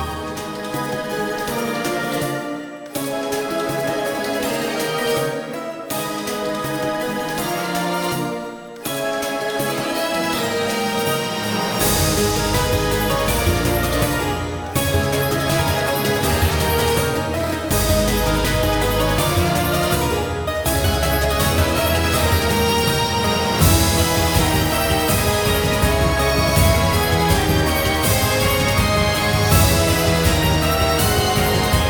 free Spin Ambience; codex of fortune

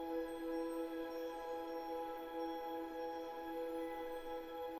main Game Chord E; fairymirror

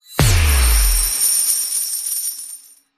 win Impact; godsoffortune

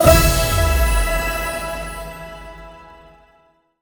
mega Win Stop; codex of fortune

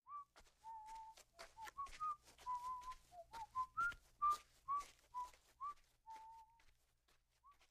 ambience Mex Walk; colossal pinatas

